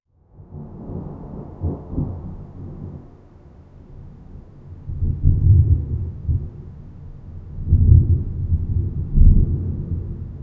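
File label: cat being thunder rumbling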